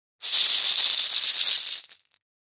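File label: Cabassa Shekere Short, percussion shaker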